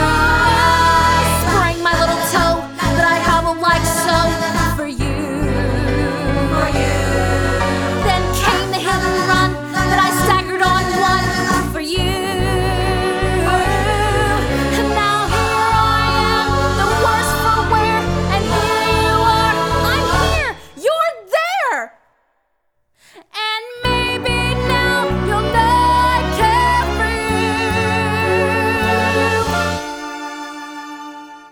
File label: vocal